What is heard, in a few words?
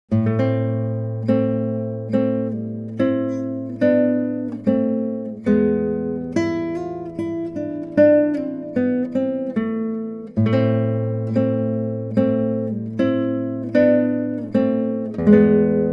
moderato, guitar